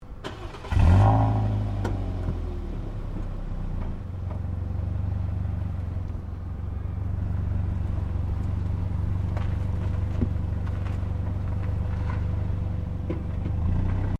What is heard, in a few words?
Car Toyota Yaris exhaust startup; Toyota hire car; Cars; Vehicles